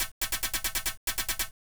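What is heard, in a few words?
HIHAT ELECTRO 140, Techno Drum; Techno2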